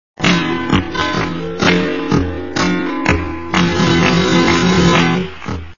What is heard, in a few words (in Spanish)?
buleria SLOW